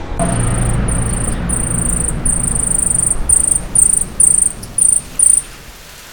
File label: Hylocharis chrysura territorial